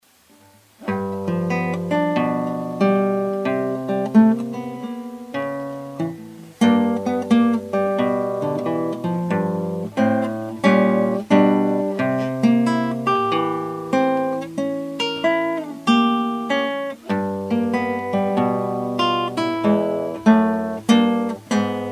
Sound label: Blues